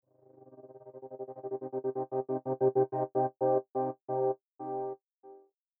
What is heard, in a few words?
Hrn Trem; tremolo